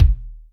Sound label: BDaftty, Yamaha DTXpress Acoustic Kick; drum machine